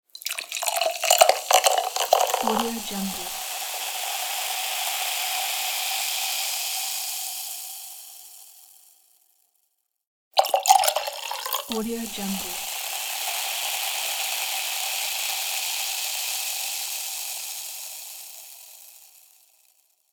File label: pour champagne